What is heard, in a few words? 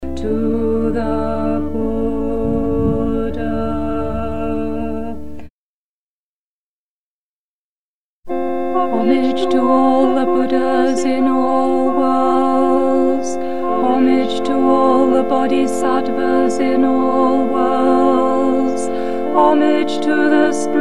alto, harmony parts morning service; Three Homages